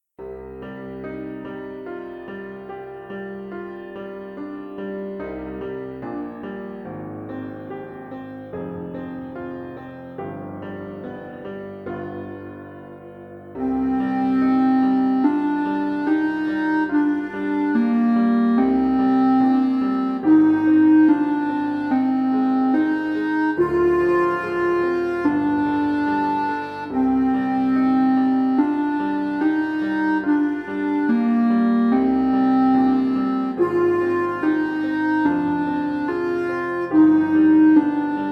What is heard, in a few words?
adagio, solo